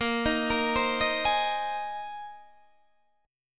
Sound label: Bb 9th Suspended 4th Bb down Guitar Open D